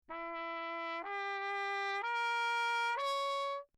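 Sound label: trumpet mute; Bach; Perinétventiltrompete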